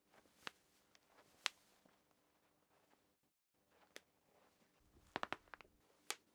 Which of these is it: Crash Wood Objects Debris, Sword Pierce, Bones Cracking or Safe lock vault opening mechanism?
Bones Cracking